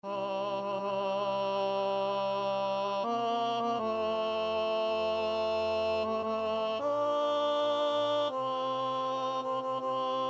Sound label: Chorus tenor